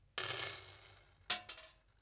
Small Nails Dropped On Bench, One; Construction; BBC Sound Effects Library

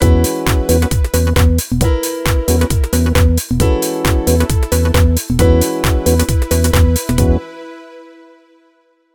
Signature Sound; FXHome; Signature Tracks

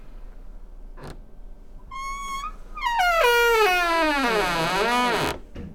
Low Creak, Door; FXHome; Squeaking

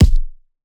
Punching Percussion, Straight Hand, processed; 99Sounds

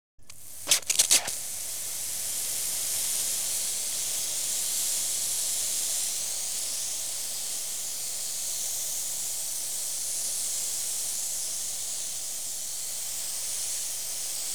Hose Pipe; Rivers Streams Water; BBC Sound Effects Library

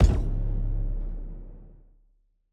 Impact; 344 Audio; Epic Impacts Vol. 1

